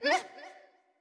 Wimp Hurt

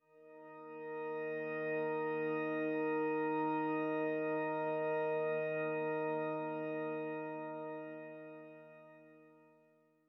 Church Organ; Grindstone

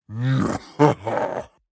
Uber Wind Up; Baron

